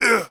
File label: Archer damage